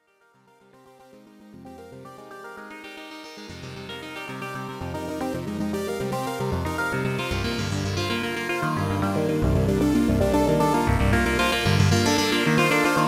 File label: Stacked Polys